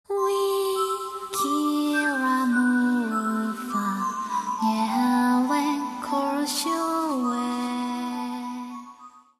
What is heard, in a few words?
healing hymn